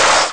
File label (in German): hausabriss; Amiga Soundtracker Sample Packs